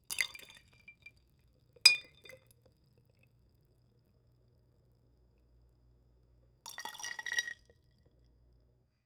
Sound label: the sound of water being, filling